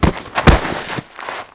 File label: pad; Mafie 1